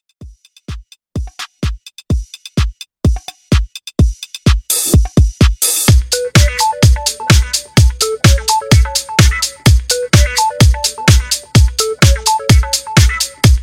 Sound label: In Outro House 127 Bpm Clean